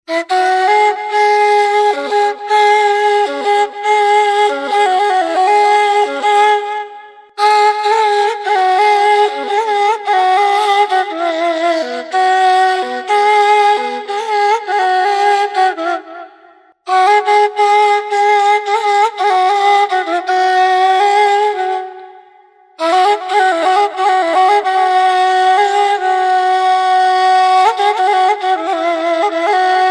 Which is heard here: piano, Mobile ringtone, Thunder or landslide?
Mobile ringtone